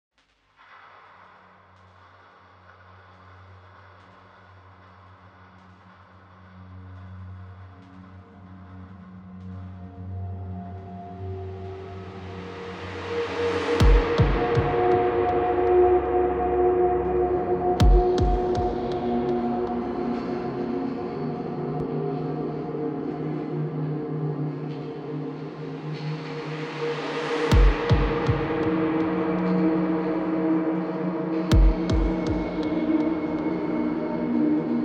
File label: vanadium ambient; weekly beats 2020